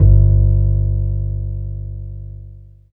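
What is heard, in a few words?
bass G2; East West - Phat & Phunky CD2; 90's Sample CDs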